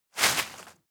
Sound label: step walk graze leaves